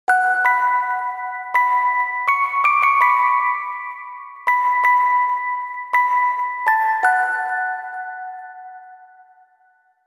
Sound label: Music Box; Grimoire Song; Rune Factory Frontier